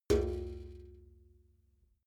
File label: metal bucket hit soft